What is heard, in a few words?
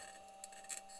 mic, mars2020 edlcam